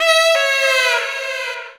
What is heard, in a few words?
Sax Bend; Sonic Foundry (Sony Creative Software); Knutrix Drum & Bass